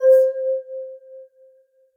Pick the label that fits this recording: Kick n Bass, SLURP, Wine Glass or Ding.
Wine Glass